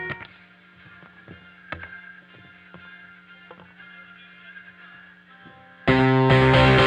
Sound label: Guitar